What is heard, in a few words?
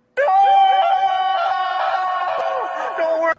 noooooooooooooo